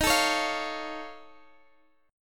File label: F Augmented 7th D sharp, chord